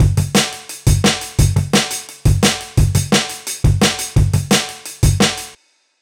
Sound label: DRMLP, Drum Loops; neuro; Stanza